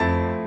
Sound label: piano sus